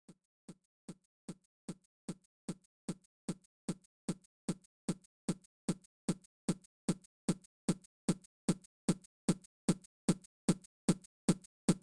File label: Messy Beat